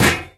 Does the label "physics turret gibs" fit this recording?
Yes